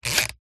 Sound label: fan dial turn timer